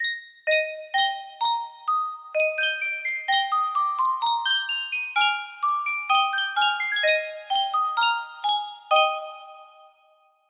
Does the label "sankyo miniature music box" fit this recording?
Yes